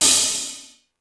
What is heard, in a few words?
trsh crsh, cym; sr16